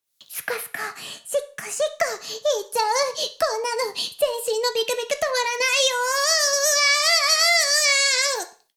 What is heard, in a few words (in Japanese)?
シコシコ音声; 古き城のドラゴニート